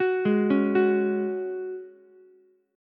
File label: Gb Suspended 2nd, Ukulele; arpeggiated